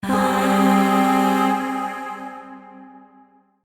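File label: Shawzin Minor Chord; Warframe; Zariman; Void Shawzin; Chords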